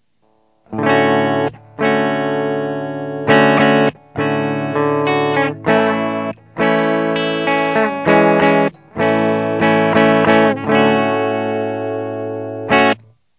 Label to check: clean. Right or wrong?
Right